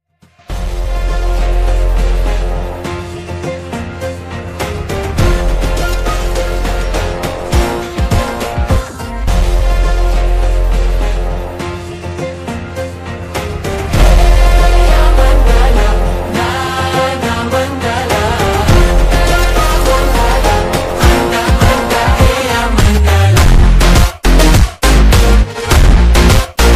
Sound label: Funk Extended Phonk; TikTok Version